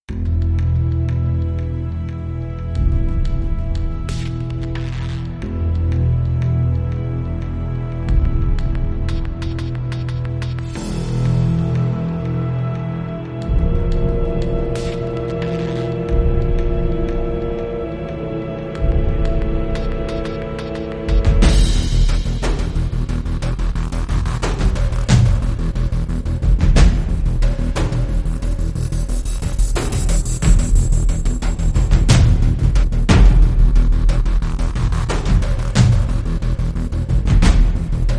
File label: No Spiccato Strings Piano and Harp